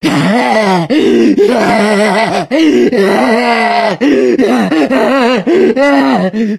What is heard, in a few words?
jockey lurk; voice; idle; Left 4 Dead 2